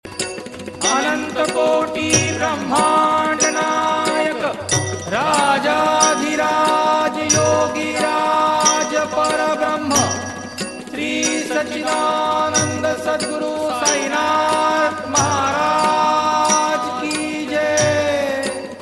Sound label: RINGTONE